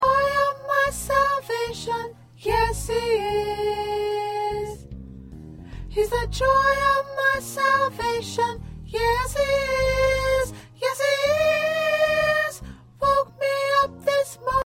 Soprano, choir

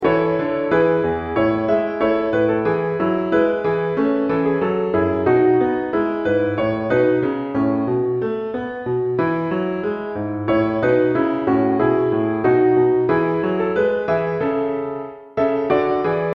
Piano

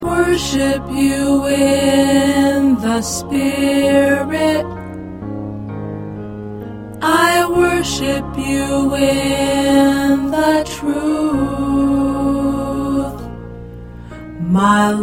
Tenor

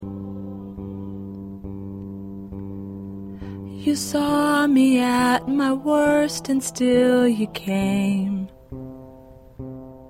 Voice

